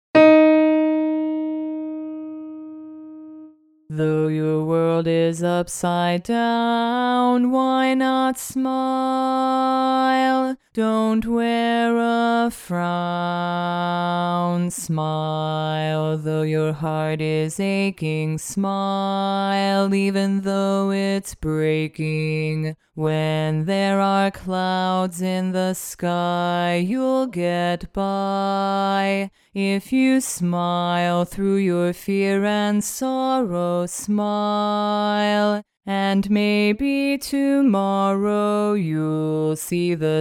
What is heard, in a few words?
Bass Alone